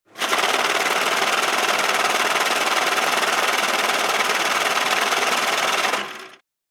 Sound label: Cash counter machine